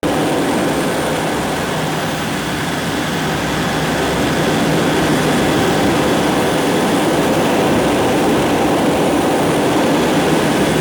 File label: Arch Wing Movement Idle Loop; Vehicles